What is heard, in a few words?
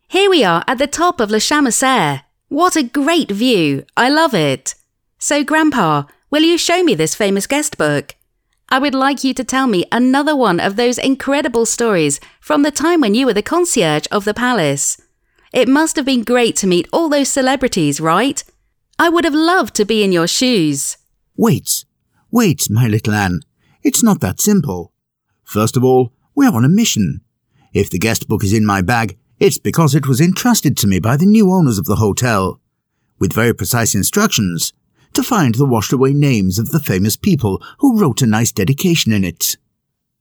an english rocker